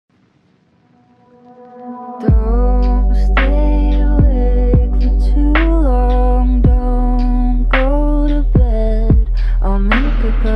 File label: Lofi Hip Hop Remix